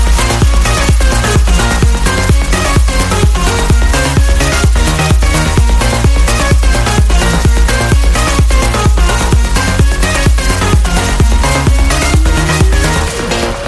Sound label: Progressive House